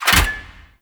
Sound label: Ship Rescue Tube Stop; Gameplay; Ambience; Warframe; Corpus Ship Remaster